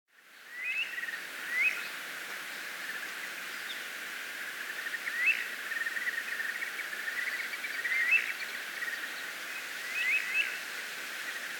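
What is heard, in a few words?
Falcated Duck, hyogo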